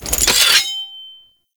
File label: Grineer Royal Guard Sceptre Pickup; Foley